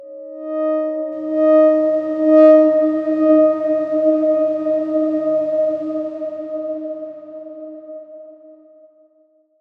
Darkswarm D#4 mf; healing soundscapes; Ableton

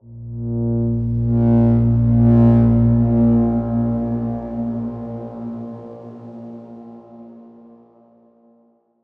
Darkswarm A#1 f; HSS Ableton Pack1

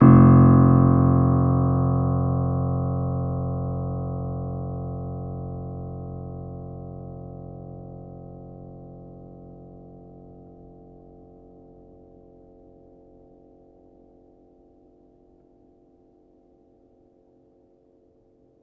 Player, Upright Piano; healing soundscapes; HSS OP Pack

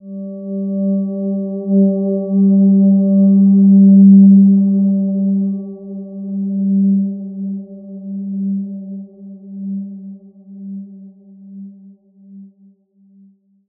Silver Gem G3 p; healing soundscapes

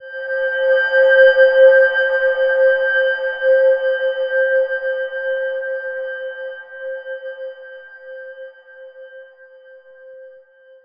Silver Gem C5 f; healing soundscapes

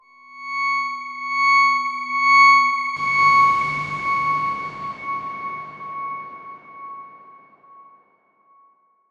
Darkswarm C#6 mf; HSS Ableton Pack1

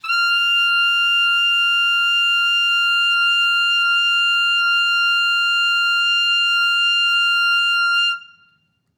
Clarinet, sus Long F#5

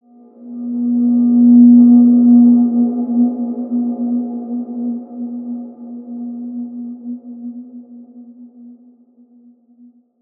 Large Space B3 p, healing soundscapes

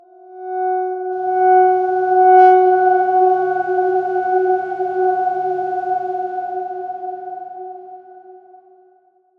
Darkswarm F#4 f; Ableton; healing soundscapes

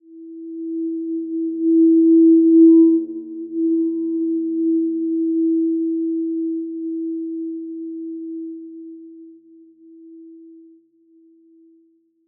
Simple Glow E4, healing soundscapes; HSS-Custom-1

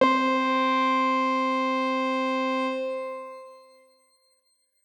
X Grain C4 pp; healing soundscapes; HSS Ableton Pack1

